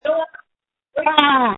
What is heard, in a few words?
screams